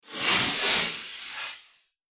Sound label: Sci Fi Transform Evaporation Disintegration